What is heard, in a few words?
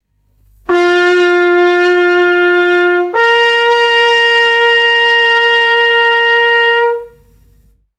bright brass coach horn call